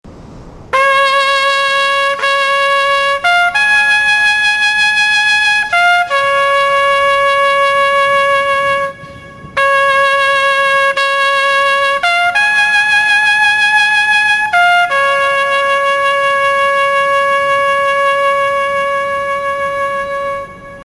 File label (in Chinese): 集结号; 初中; 广播室铃声系统